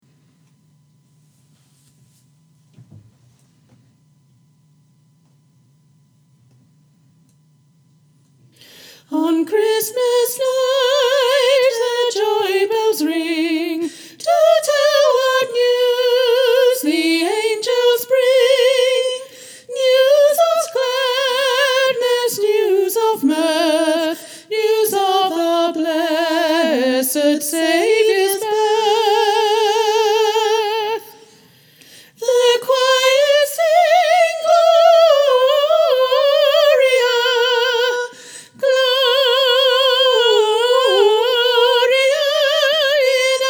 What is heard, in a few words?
V12 SOP